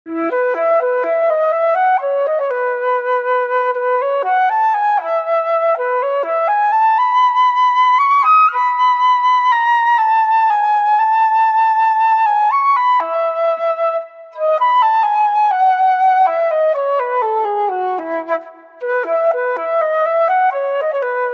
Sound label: flute melody; ringtone